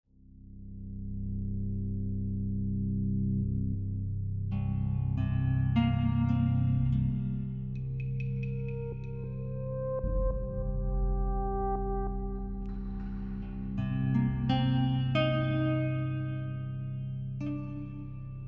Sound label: menu music ambient; Day of the Infected